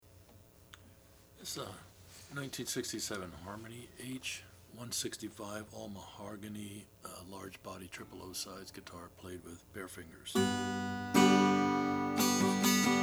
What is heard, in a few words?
harmony h165 natural mahogany, Guitars Acoustic Vintage Blues; 1967